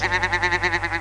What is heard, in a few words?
sheep; Birth of Jesus Activity Center